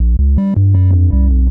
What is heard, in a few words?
bass (acid); Sonic Foundry (Sony Creative Software); Ugly Remnants VOL-2; Knutrix Demo